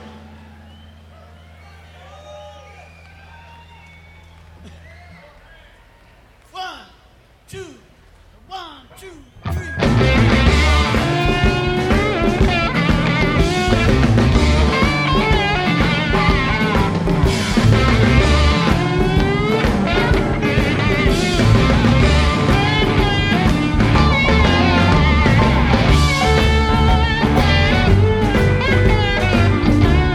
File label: jazz